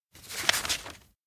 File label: note paper hold; anemoi